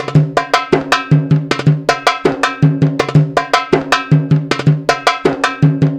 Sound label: PERC LP, Arabian Traditions